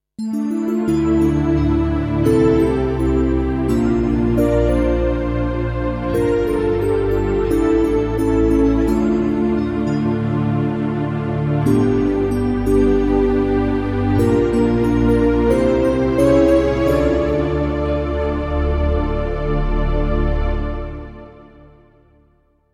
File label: Fanta Bell